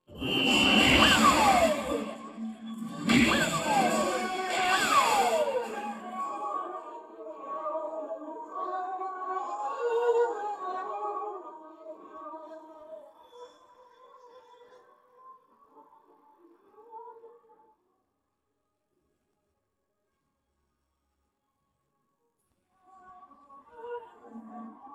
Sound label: vocals